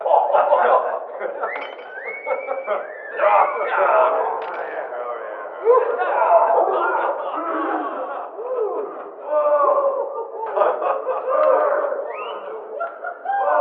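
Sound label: ambience in house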